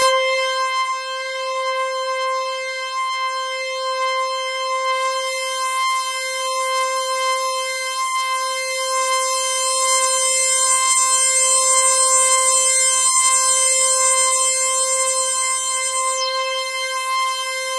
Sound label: Roland JV 2080, poly saw